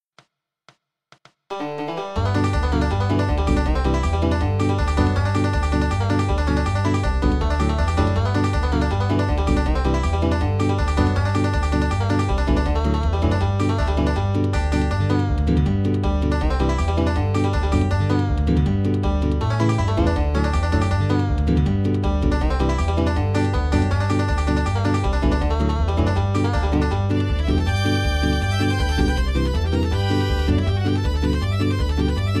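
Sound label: Bluegrass Banjo Fiddle G; backing track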